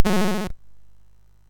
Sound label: Dragons Den, MOS6581R3